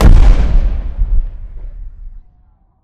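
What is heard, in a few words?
explosion distant kaboom; corporate; Titanfall 2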